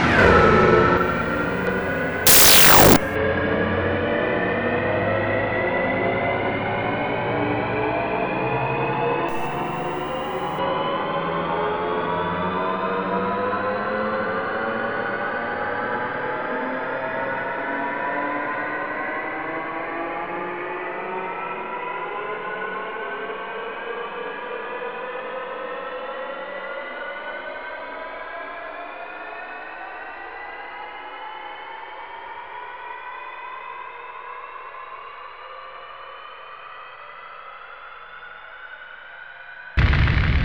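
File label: takeoff, synth fx; Zero G; Malice In Wonderland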